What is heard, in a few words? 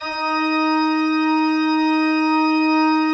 D3, Cathedral Organ; ILIO; Propeller Islands Cathedral Organ